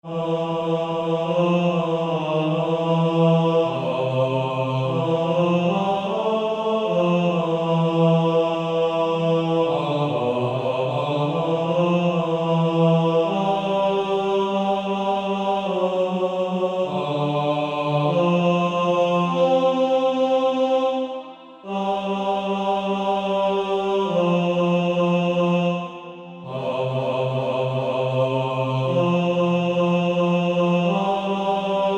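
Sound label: Male Choir, bass 1, Practice Music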